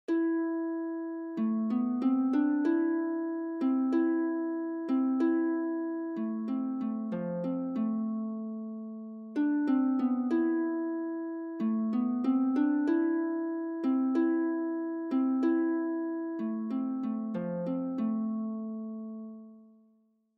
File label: harp